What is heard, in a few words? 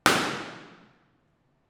IR Balloon